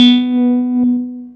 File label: piano